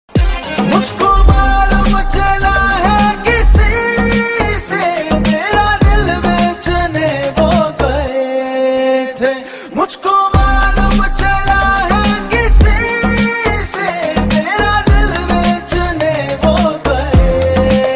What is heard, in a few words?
Song Love Ringtone